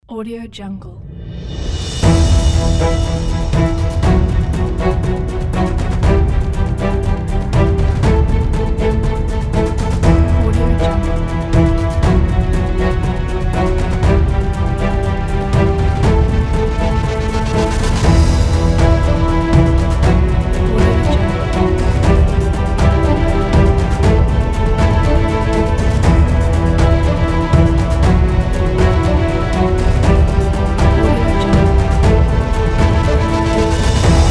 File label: epic teaser